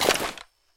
pistol helping hand retract; weapons

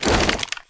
swish weapon swing swipe; survivor

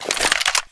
rifle deploy, rifle desert; weapons

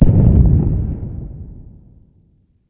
dist explosion, ambient

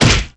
punch boxing face hit; survivor

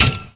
switch press, buttons

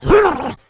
rage, infected, female

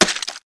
flesh, footsteps infected run

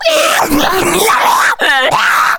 rage, infected, male

